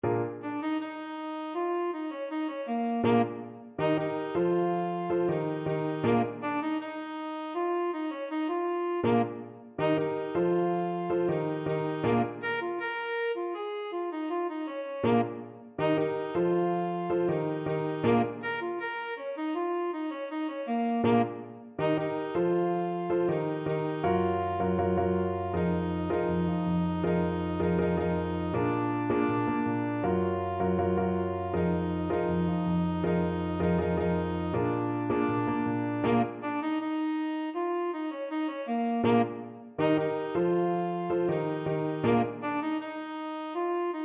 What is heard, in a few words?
tenor saxophone